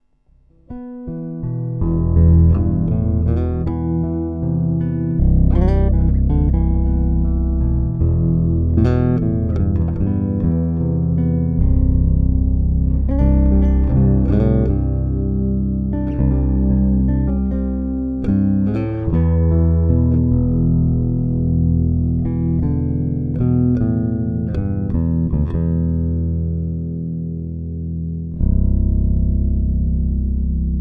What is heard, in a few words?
3 Track Jam